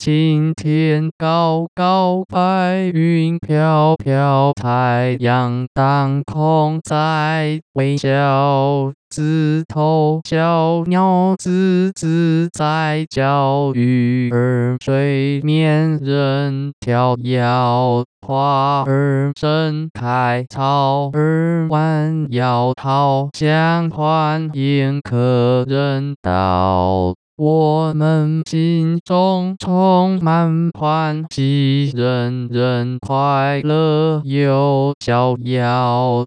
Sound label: song male, vibrato; Happy song